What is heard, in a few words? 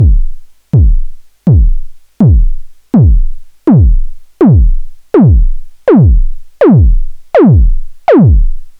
monotron kick; JAMUARY2021